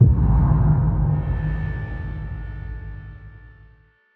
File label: Stingers Sting Pain